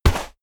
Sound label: step walk sand; schritte